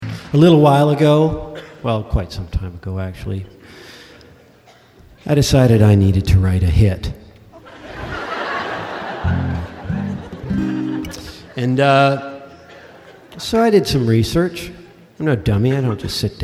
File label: latin music